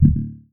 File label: Bass